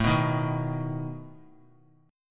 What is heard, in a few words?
A Suspended 2nd Flat 5th A down; Asus2b5 strummed